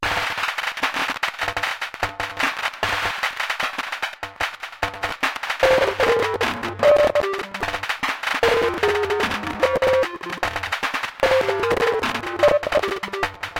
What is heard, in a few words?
RINGTONE